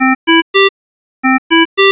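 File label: cardiac high, alarms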